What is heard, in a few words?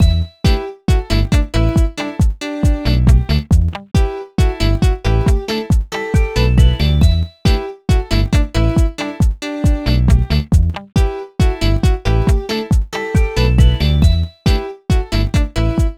BGM Multiplayer; Foto Frenzy Spot the Difference 2009